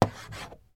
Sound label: office chalk stick single stroke on chalk board; sound effects one